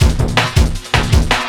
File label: Drum & Bass, 160 BPM